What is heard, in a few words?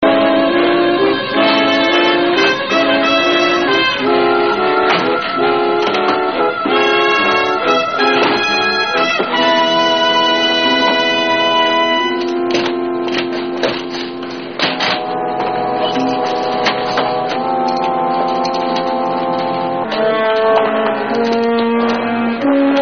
dramatic music